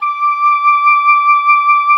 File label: D6, OBOE; Xsample VOL-6